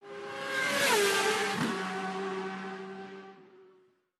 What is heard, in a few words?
Misc Drive By; SSO Misc